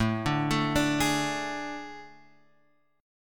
A Major 7th Suspended 4th A

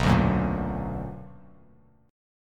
B Minor Major 7th, down, Bass Standard; strummed; JGuitar

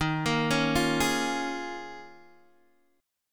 Eb 9th Suspended 4th, Guitar Open G; down

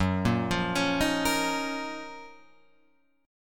Gb Major 13th Guitar Open F; GbM13 arpeggiated; JGuitar

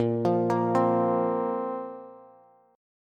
A sharp Suspended 2nd A sharp down Bass

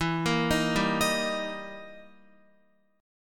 E Minor Major 7th Flat 5th E down Guitar; EmM7b5; arpeggiated

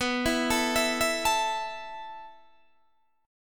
B 7th Suspended 4th B down Guitar Open D

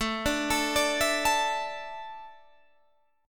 A Suspended 4th, Guitar Half Step Down

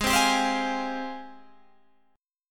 Ab Major 13th, Guitar, Open G

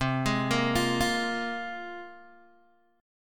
C 7th Suspended 4th Sharp 5th, Guitar, Open G

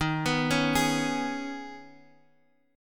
Eb 7th Suspended 4th Sharp 5th

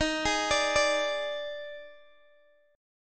D sharp Major 7th Suspended 4th, Guitar, Open D; chordshape

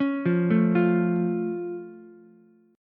C sharp Augmented down Ukulele, chord; arpeggiated; JGuitar

